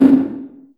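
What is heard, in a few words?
large room 808, reverb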